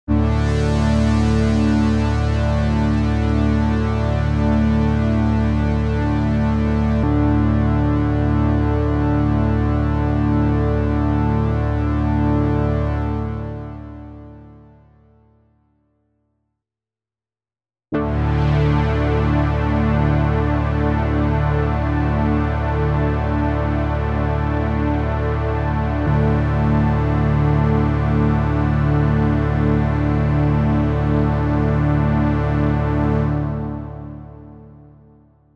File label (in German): Pad erst mit dann ohne Analogchorus; Proton